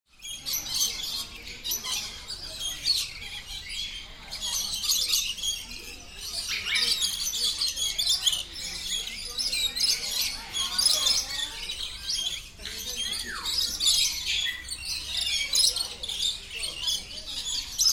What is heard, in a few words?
sounds from a bird park in Malaysia